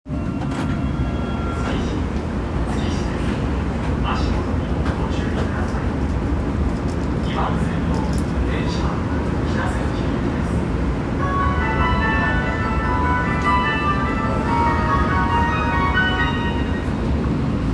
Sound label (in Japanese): 日比谷線築地北, train sounds